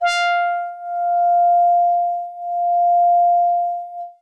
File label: Soft Horns DX100 F5